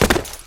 jump

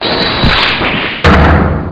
dance worried; souseki

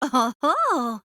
hoho; tenko

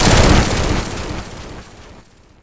break big; sonic

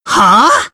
huh

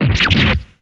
stab; gyakuten touhou

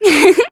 giggle; mukuro

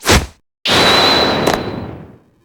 badgepull; bobby

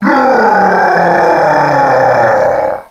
rawrrrrrrrrr; bowser

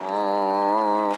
in pain

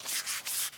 paper plane; sorin

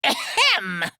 "ahem"; monokumav3

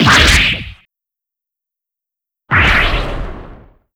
damage full

